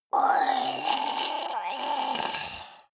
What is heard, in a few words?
monster die gurgling high; kreaturen